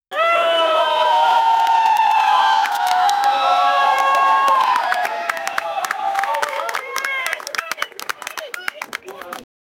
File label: women cheering the name r